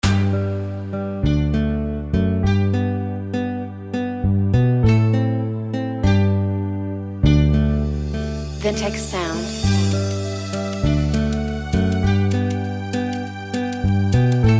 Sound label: Rain instrumental